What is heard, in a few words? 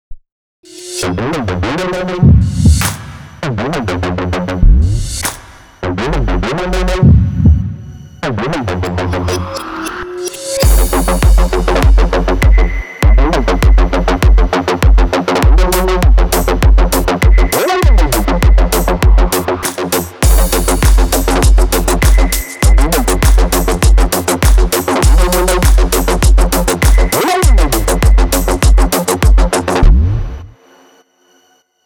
Background music for fashion show commercial